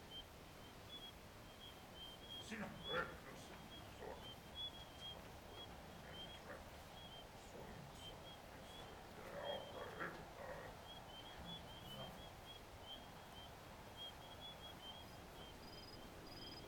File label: orc camp desert night, ambiences; Michael Ghelfi